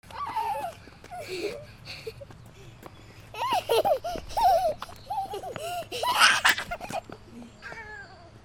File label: FOOTFALL